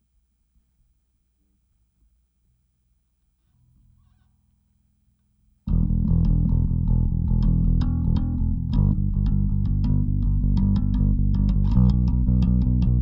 Bass Overdrive Comp

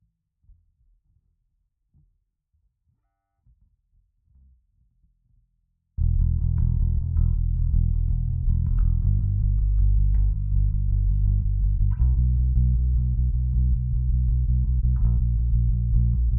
Bass DI

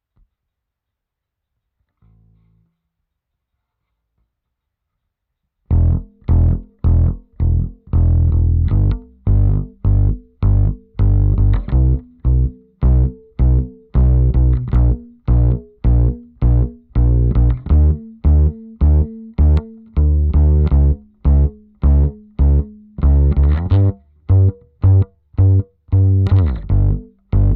Upright Screamer

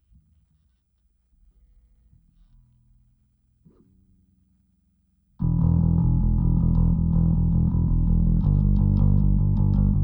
Felt OD Comp

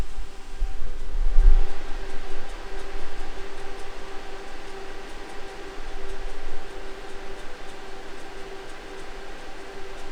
Ambient Server Room

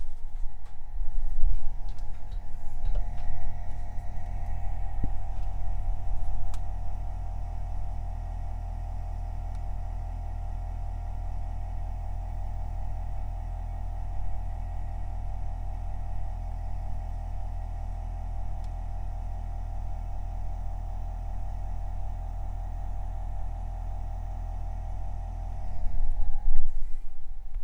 Mechanical Drone

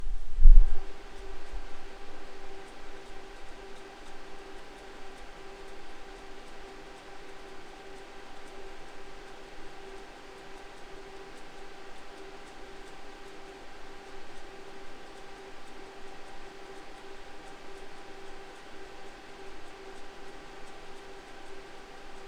Ambient Server Room

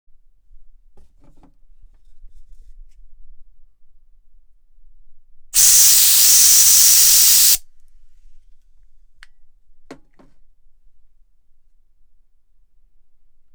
Air Can

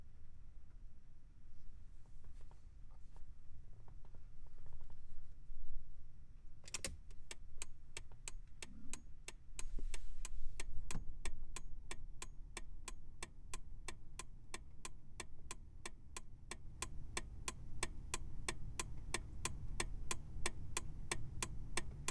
Van Turn Signal

